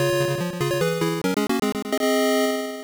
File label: Event Start; Hyper Sports; arcade